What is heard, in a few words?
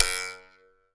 BUZZ STIC, African percussion; Voice Crystal - Steve Reid Definitive Percussion